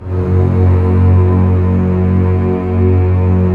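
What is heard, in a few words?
strings, contrabasses arco, orchestra; String Master Series; Cbs2 Orchest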